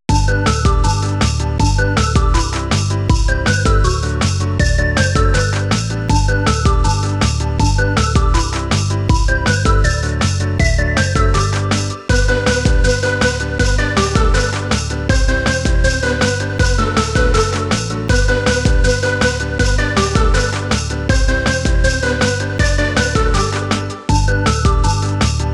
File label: Jingle Bells; mod crapteam